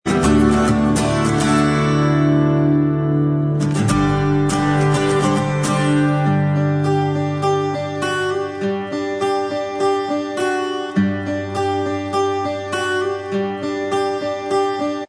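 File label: acoustic, instrumental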